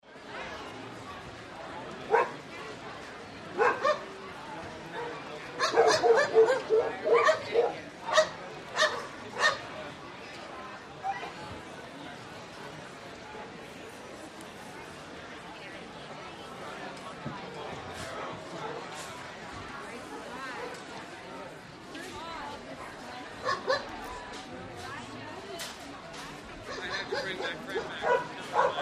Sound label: Dog Show BG Crowd Dogs